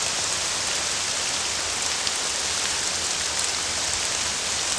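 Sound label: rain heavy; envrn; The Elder Scrolls III Morrowind GOTY; XBOX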